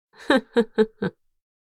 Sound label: Adult female laugh chuckle